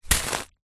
leisure juggling bean bag small catch in hand and squeeze; zapsplat